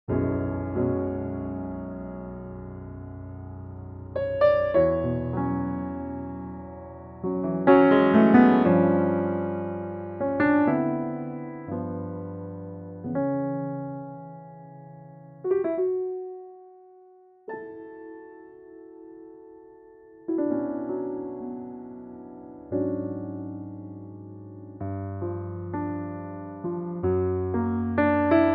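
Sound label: Bbm piano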